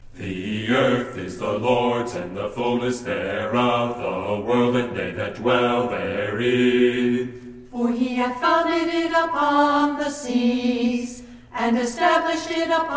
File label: a capella scripture song